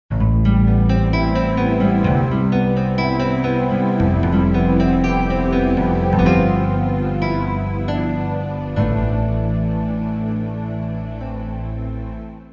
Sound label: guitar and strings, roland xv5080